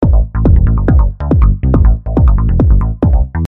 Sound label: loop